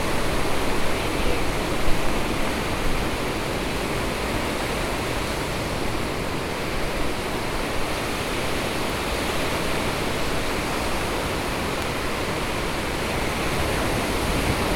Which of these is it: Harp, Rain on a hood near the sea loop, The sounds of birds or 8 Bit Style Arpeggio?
Rain on a hood near the sea loop